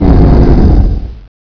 MOAN; ghouls forest2; bzomb

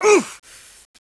ct pain

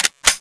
pp2000 clip in, weapons

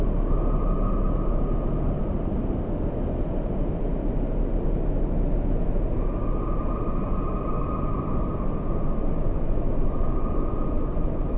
sub tunnel noise

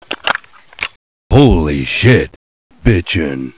rpgpickup

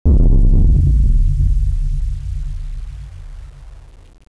hit

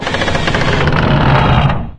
dog straining; scmod; synthcrab

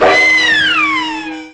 scream

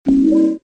pickup

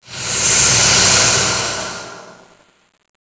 steam burst

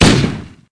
fire ar, weapons; halo ar

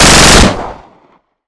famas shoot loop, weapons

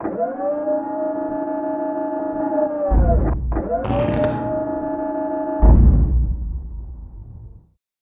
machine distant

